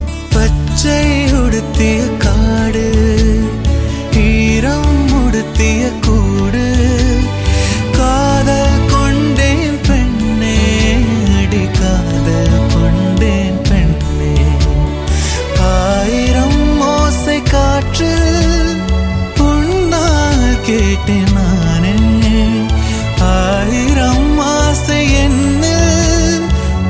Male

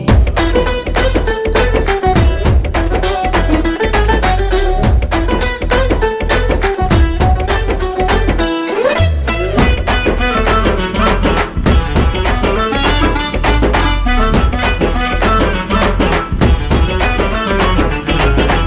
Extraordinary Music

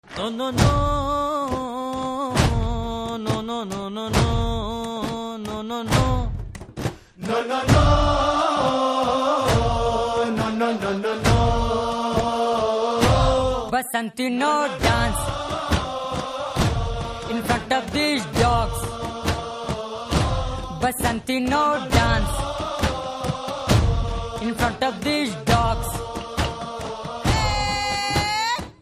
Dance Theme Ringtone

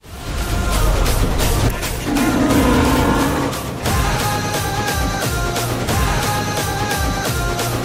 Bgm Ringtone; Anbarivu Trailer

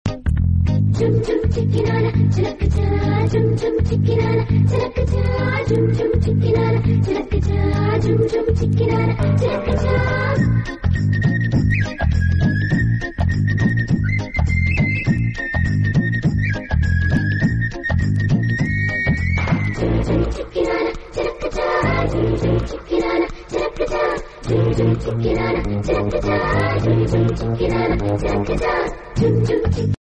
BGM Humming Ringtone; Murari

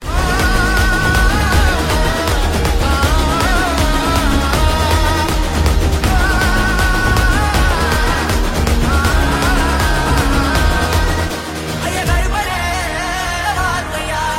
Fight Bgm; Karuppu

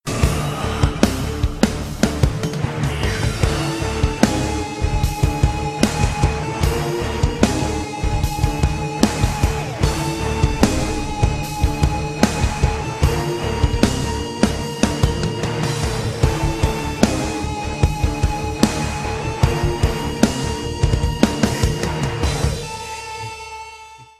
Suspense BGM

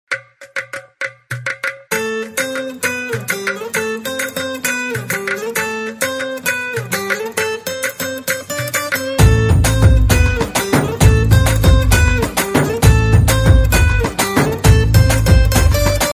Instrumental Ringtone

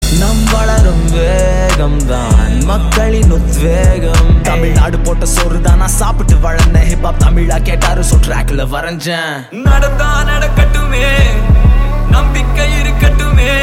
Indie

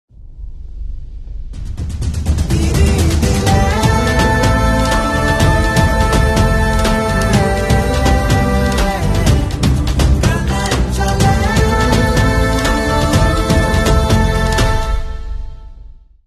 Promo Bgm Ringtone; RadheShyam

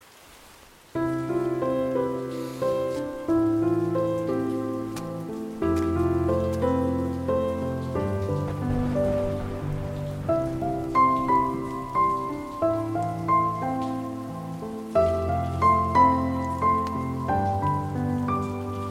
Rainfall BGM

